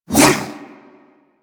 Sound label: Kunai Whoosh; Stalker Kunai